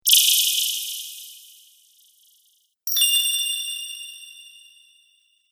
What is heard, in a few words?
Magic Spells; Magic Sound Effects